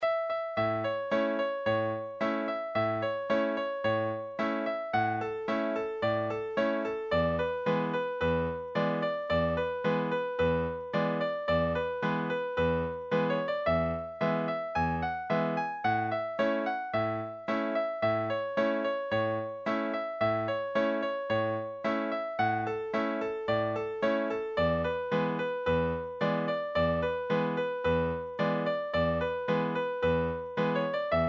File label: 55bpm